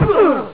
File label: twist cry; Amiga Soundtracker sample